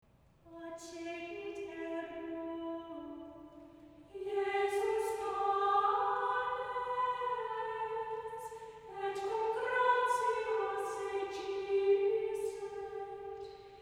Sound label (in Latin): Accepit Ergo Antiphon Mode1 G